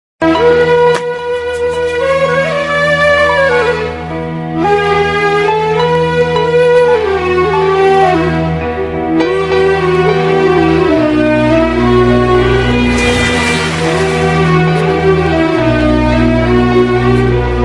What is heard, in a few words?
sad bgm ringtone love bgm ringtone south indian bgm